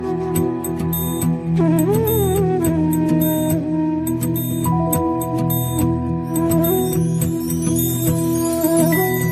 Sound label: Ringtone